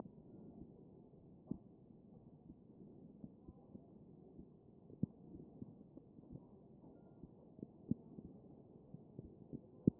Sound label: chest